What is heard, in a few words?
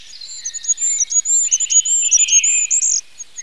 Common Firecrest